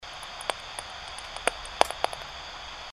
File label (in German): Fledermaus; franzis fledermausdetektor